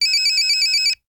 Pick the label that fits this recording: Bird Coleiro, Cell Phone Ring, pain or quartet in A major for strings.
Cell Phone Ring